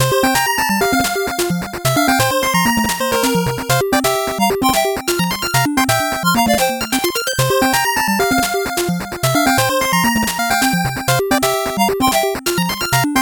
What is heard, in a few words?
8bit bgm fast